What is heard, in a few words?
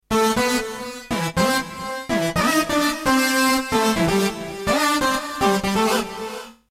Moog; d-50